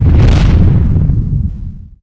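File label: flamethrower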